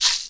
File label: box rattle; Kenya Box Rattle